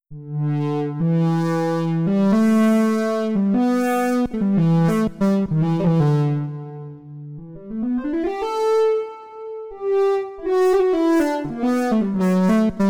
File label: pulverizer sample